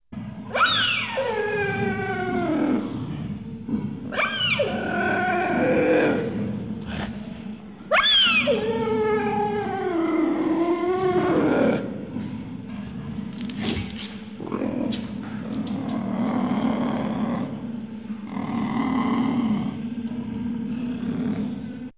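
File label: cheetah, チーター 鳴き声; アフリカ、西南アジア、中東などのサバンナにすむ、陸上では最速の動物。; オスだけがなわばりをもち、若いオスは成長するとグループをつくって母親のもとを去ります。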